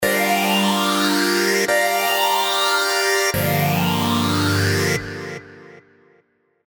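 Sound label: lead, tropical pops; mango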